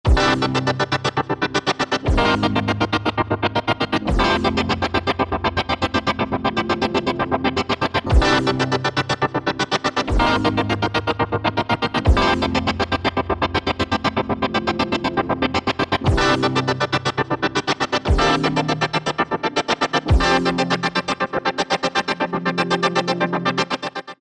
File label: Jumping, E-mu Proteus VX